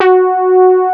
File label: SB HORN; Wizoo - Powered Nord; STEREO LAYER